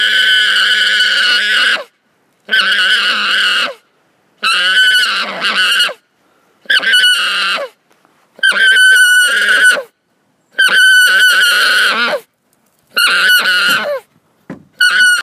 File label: Scream, alpacas